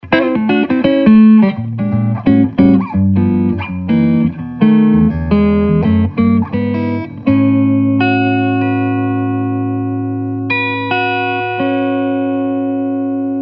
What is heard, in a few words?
Neck clean, Greco LP Standard; Greco 1979 LP Standard Cherry Sunburst